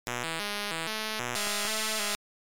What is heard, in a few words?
Title Beeper; Colony